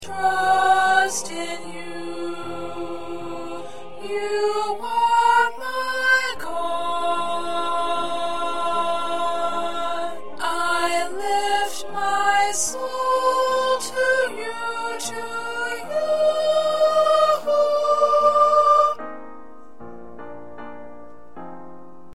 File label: 1st Alto